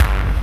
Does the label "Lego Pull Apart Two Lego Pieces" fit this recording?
No